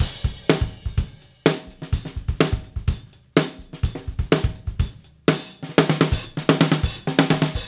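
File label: 125 bpm, beat loops; Zero-G; Monster Beats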